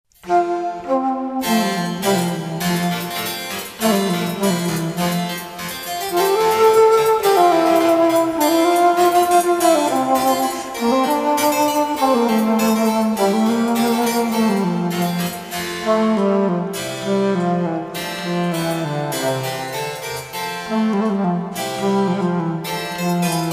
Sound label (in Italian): basso presto, querfloete; pergolesi